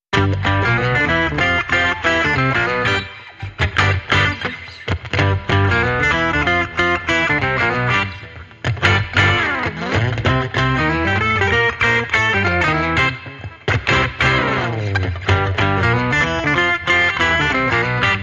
Electric Redneck, No Drums

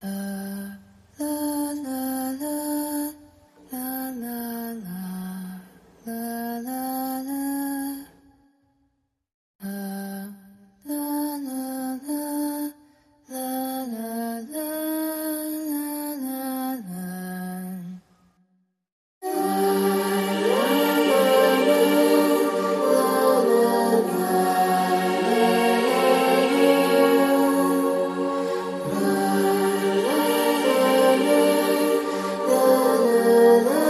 Vocals Only

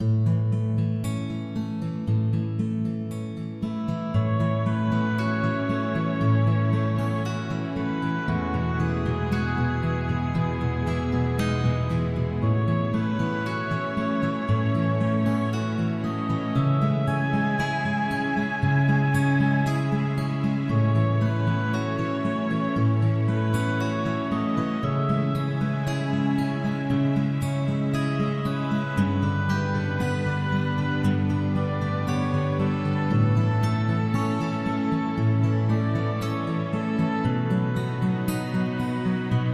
Oboe

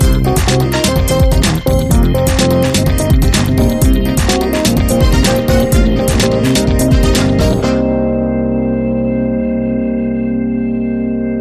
Funky Model House

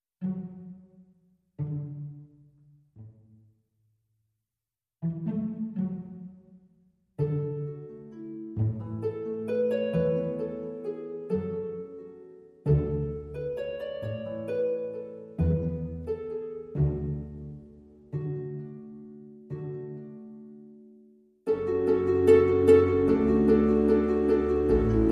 Orchestral Bed Mix

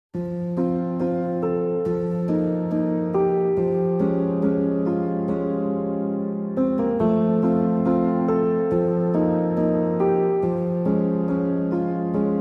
Piano and Bells

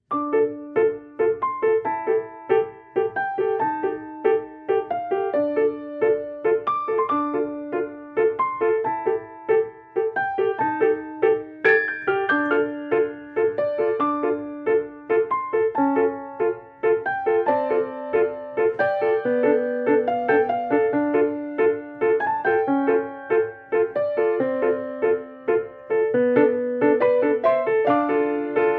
Piano

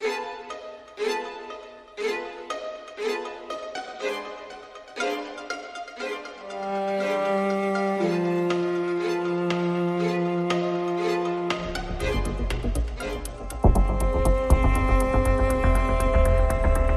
Aggro Strings

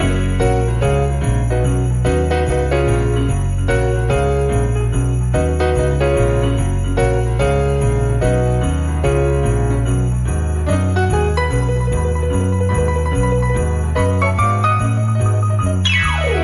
Piano Sleigh Bells Loop

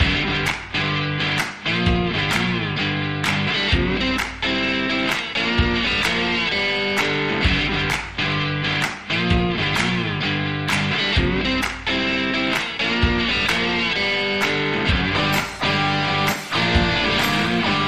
Blues, Underscore

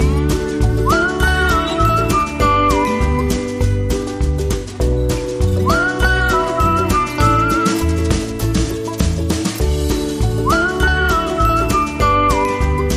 Intro Whistling

